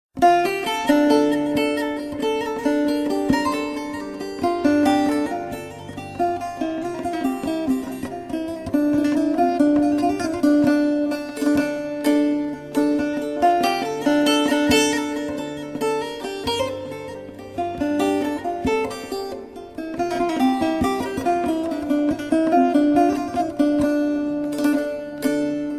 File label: saz